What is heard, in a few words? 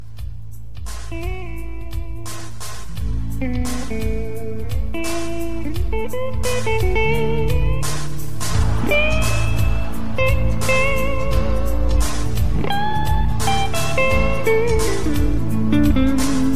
Jazzzzzz